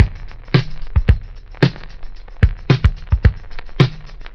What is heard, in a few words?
dusty disco, funky drum loops